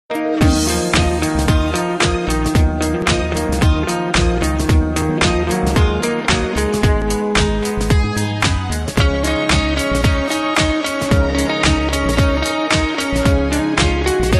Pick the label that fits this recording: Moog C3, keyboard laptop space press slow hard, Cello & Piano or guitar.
guitar